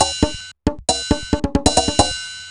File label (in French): SUCCES; MOBICLIC 89; MOBICLUB